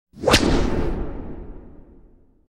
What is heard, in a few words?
Big Whip with Dark Reverb; Hollywood Edge Sound Designers Tool Kit